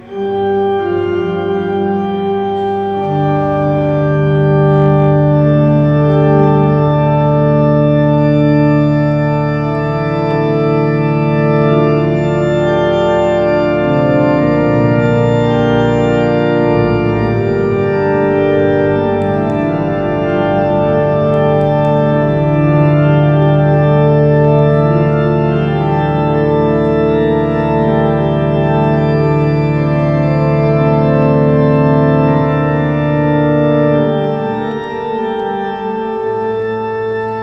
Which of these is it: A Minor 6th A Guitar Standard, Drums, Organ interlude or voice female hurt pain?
Organ interlude